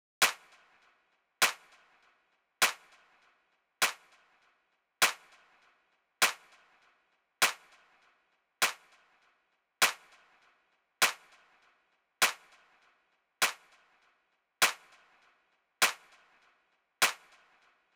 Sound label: Snare